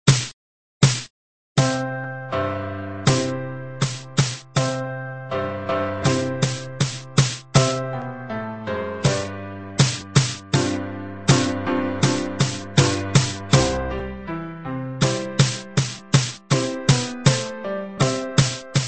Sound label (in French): baguettes pour tambour et piano; mes premieres baguettes